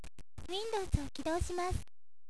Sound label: win